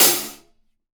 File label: hihat